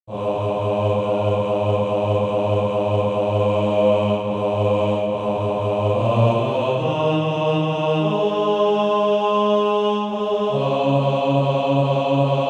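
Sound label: bass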